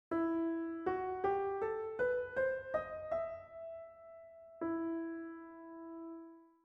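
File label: E minor scale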